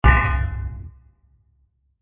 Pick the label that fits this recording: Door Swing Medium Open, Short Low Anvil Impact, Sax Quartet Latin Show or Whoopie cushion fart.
Short Low Anvil Impact